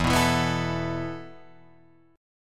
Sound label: E Suspended 4th E, down, Guitar, Drop D